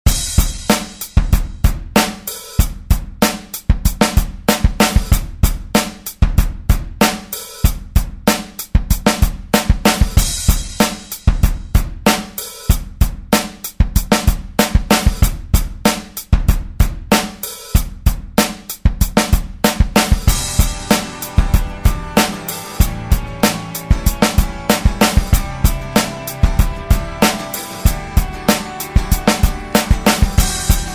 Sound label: Rock Redrum; Deejay Hit